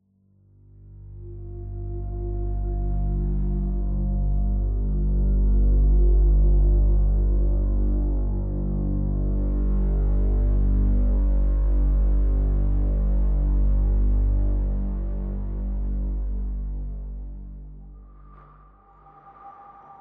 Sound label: ambient